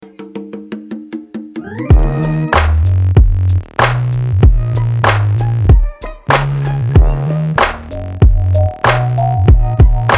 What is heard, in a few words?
WestCoast 95bpm